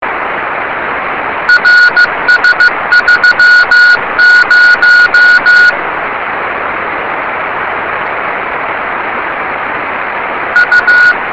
yubileiry cw; CW beacon signal of RS-30 was recorded on May 25th / 2008 by Mark KF6KYI.

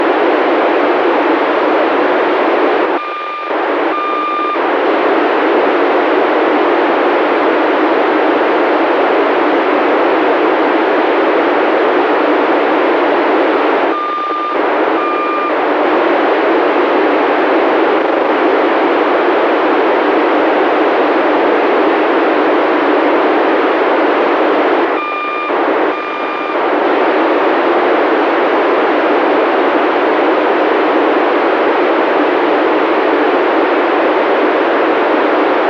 gmsk beacon 145mhz475; DCBB

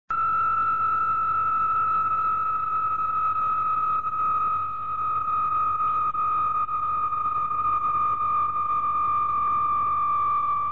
cw

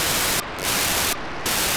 436 MHz 4k8 Mobitex beacon, satellite; Sokrat; 436MHz000; On October 1st 2022 at 15:01 UTC Daniel DL7NDR received on 436.000 MHz the 4800bd MOBITEX

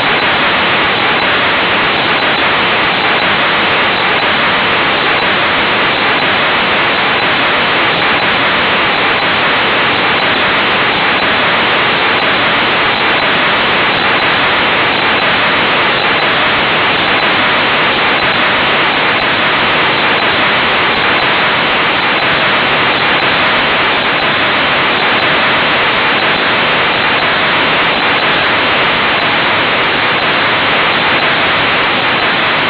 FM CW, Satellites; Orbcomm FM 25; 137MHz225; DK193WN; Enclosed signal from ORBCOMM FM-25 was received on March 28th 2009 on 137.225 MHz in CW by Patrick DK193WN.

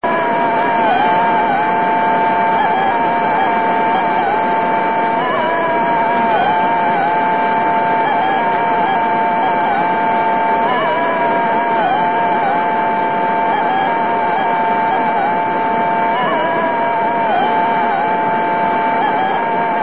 essa 2 beacon

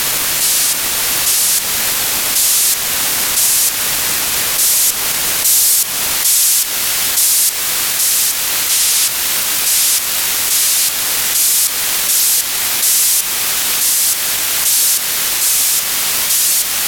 9k6 BPSK (USB), 44x beacon; Shaonian Xing (Juvenile-1F, MXSat-1); 436,370

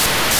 9k6 FSK (FM), 1x beacon; UWE-4; 435,6

